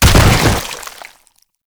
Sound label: Bodyfall Default; Enemies; Warframe; Proto Infested